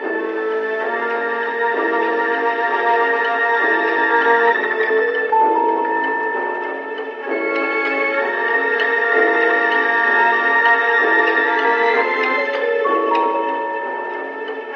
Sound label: strings, Sad; Loops